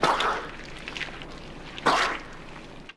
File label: Giant Octopus